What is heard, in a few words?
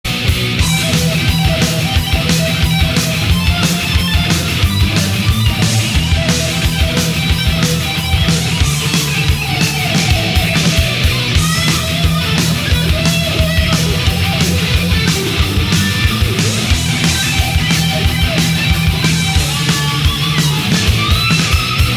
Messiah Solo